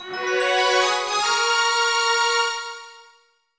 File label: All Clear; imi made wakaru otona no jukugo renshuu kadokawa ruigo shinjiten kara 5 man mon; nds